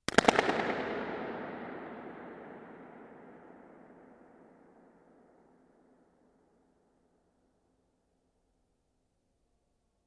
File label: balloon burst in centre of dome with mic at focus; Trevor Cox; SoundBook